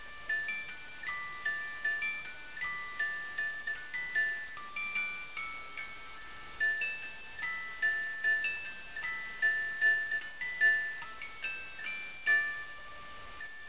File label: MUSICBOX